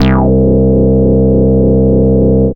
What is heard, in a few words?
Single Electric Basses, Dance Floor Bass